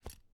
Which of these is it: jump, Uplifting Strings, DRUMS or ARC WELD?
jump